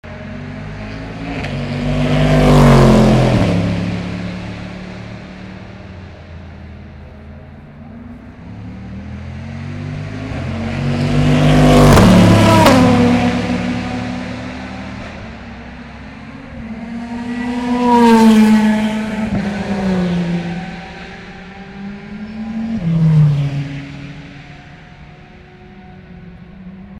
Corvette Ferrari Audi Peug aft Mulsanne Corner Sat afternoon